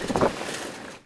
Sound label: Sail Up; Civ4 warlords